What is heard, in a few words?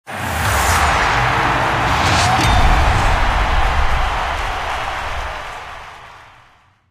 bonusgame Jumbotron Enters; netent